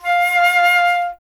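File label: flute F; Miroslav Vitous - Solo Instruments VOL-2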